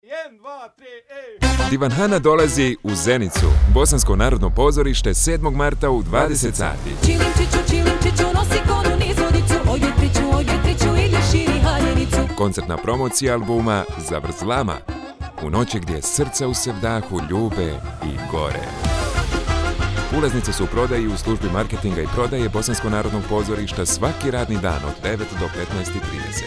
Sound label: Radio jingle; BNP Zenica